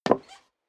step high heels wood creak single stair down; schritte

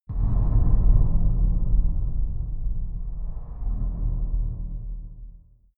far dark metal rumble, hits impacts

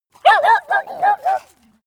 dogs bark growl; natur-tiere; hunde

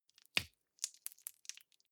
gel dispenser press squish soft; haus garten; badezimmer

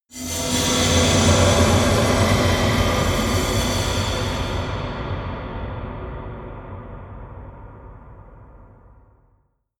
eerie industrial swell stutter; sweeps-swells

